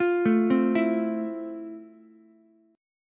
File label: F Major 7th F, Ukulele; arpeggiated; JGuitar